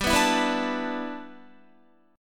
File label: G 6th Add 9th G Guitar, chord; G6add9 strummed